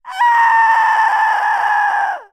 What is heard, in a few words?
Hybrid Scream